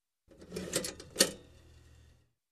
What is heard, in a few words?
Placing Bread in a toaster